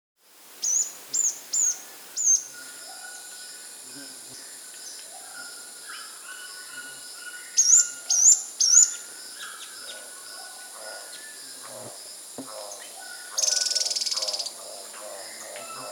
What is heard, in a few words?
Rufous-Tailed Antwren (Myrmotherula Erythrura), Andean Birds; S. America